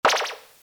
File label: Spring